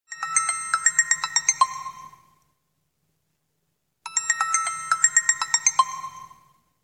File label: Chimes phone